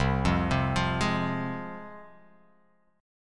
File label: C Suspended 4th Sharp 5th, C, down, chord